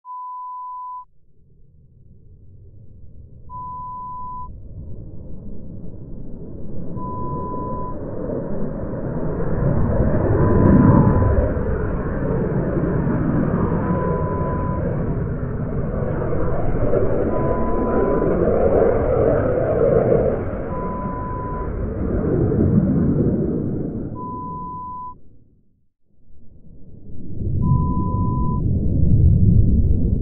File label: Eurofighter TYPHOON sound